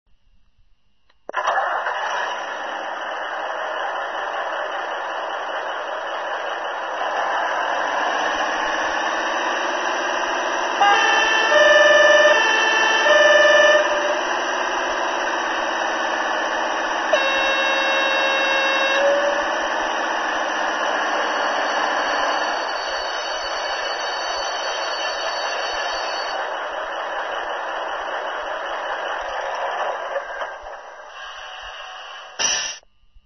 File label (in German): Feuerwehr; VL Koef3